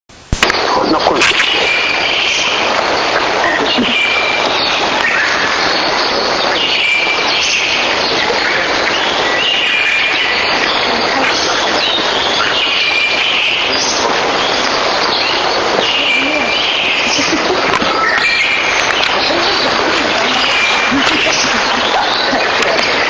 アカハラ 戸隠

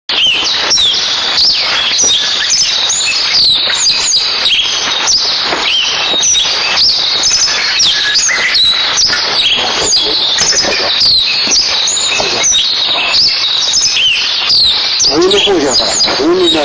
アオジ 戸隠

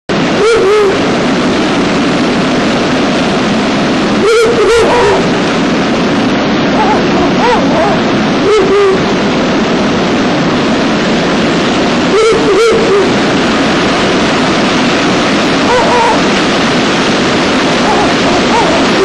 フクロウ番 少年の森

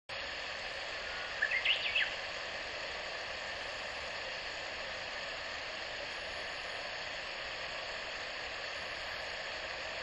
ウグイス初鳴

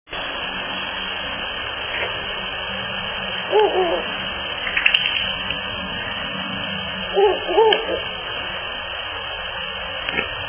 フクロウ

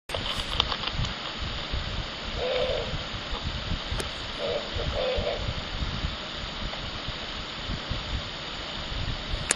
フクロウ♀

